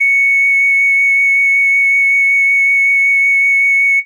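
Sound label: Pan Flute DX100 C#6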